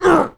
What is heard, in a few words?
Dwarf Hit; The Battle for Wesnoth